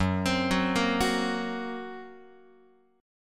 F sharp Major Flat 5th F sharp Guitar; F#Mb5 arpeggiated

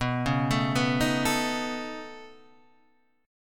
C sharp 7th Suspended 2nd Sharp 5th B

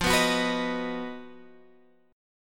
B Major F

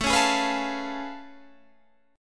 A Augmented Major 9th A down

